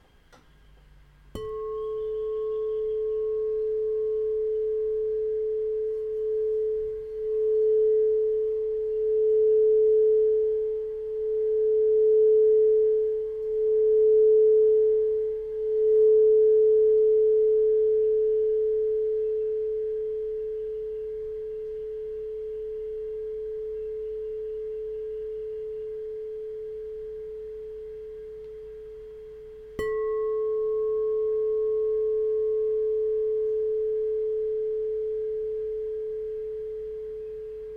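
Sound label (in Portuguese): FOSCA A4, instrumentos de quartzo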